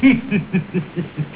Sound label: laugh